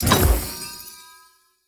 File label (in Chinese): 宝库打开遮板声